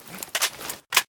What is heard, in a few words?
aks74u draw